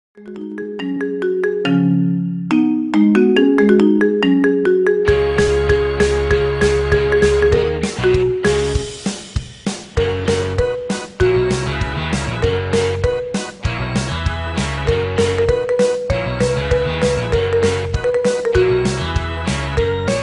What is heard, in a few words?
marimba ringtone remix